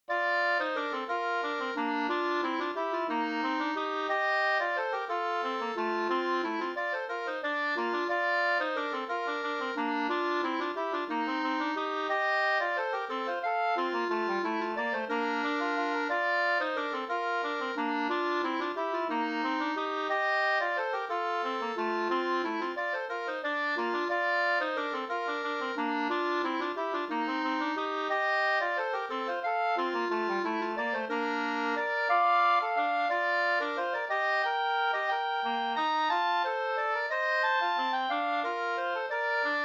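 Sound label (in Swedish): vals, two-part; Bostroms vals Og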